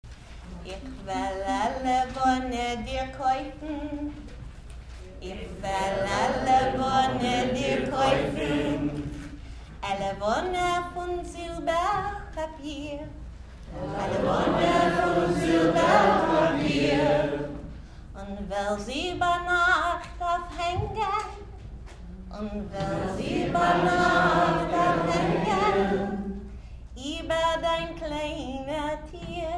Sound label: lid; troyerike